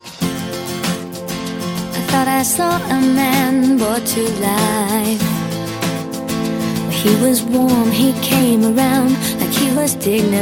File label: cadences